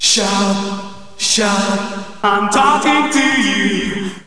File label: shout